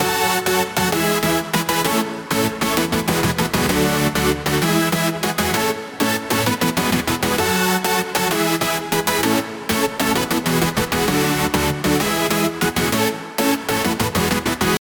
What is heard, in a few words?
Supersaw, dance chord progression, top triplet melody, F# minor, medium reverb, 8 bars; Audialab EDM Elements; 130BPM